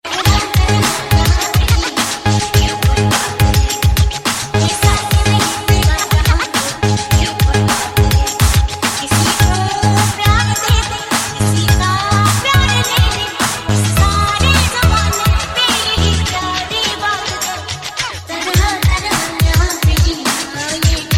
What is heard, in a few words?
Hip Hop